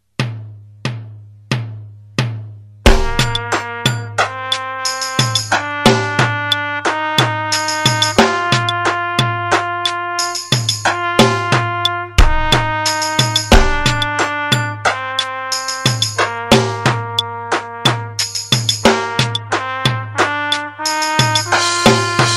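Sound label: fast metronome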